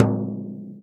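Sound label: FRAME, INDIAN PERCUSSION; Big Fish Audio - Didgeridoo and Other Primitive Instruments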